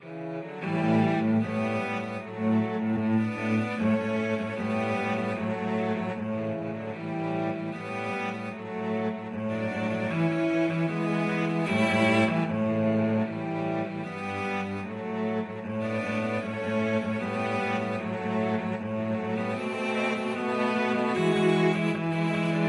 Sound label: cello trio; nazareth